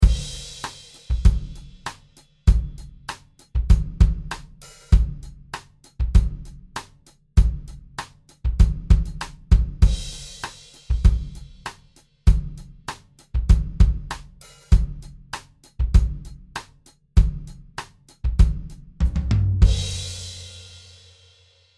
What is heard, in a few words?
Pop Drum DRY; sunset sound